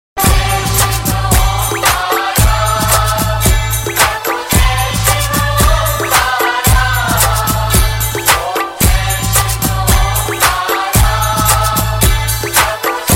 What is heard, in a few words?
Aarti Dj Mix